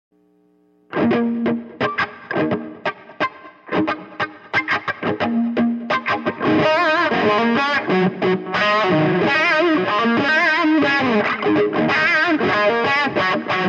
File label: voodoo wah